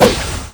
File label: Attack